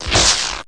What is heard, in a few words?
funky